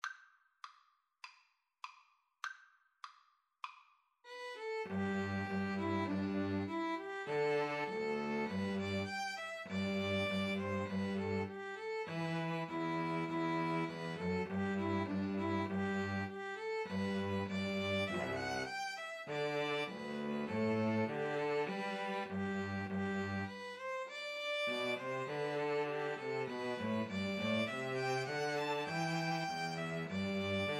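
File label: christmas eve 2 Violins Cello karaoke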